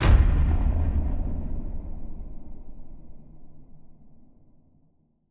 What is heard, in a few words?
Stingers sting monster